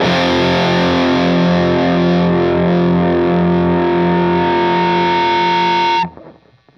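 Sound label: fuzz guitar vibrato; MAYFUZZ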